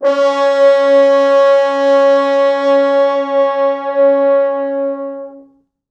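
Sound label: French horns sustain C#3; East West; Quantum Leap Horns Sax; 4 FrHorns SUS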